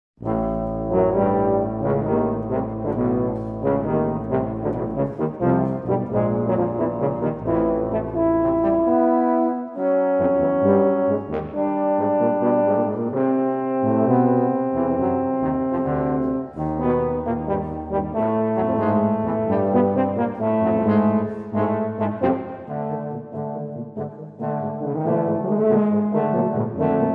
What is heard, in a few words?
Quartet for Tubas, Allegro